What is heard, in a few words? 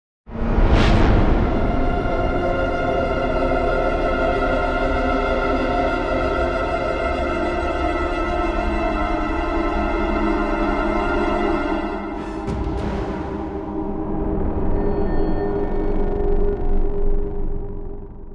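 Music Vacuum clean; Chronicles of Riddick Assault on Dark Athena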